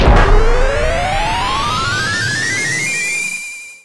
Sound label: cannon; tf2ware ultimate